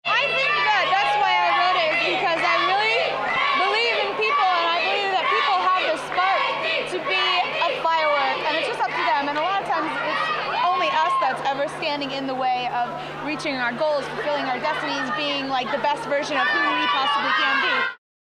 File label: Firework